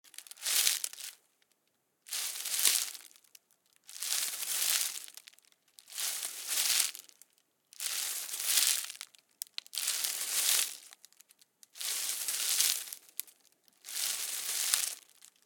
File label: Bundle Of Large Dry Bamboo Leaves Shaken; Sonocaine; The Rustle